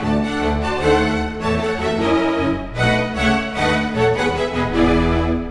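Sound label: ocean world music glouglou suspens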